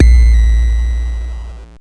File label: BD, Mutable Instruments Anushri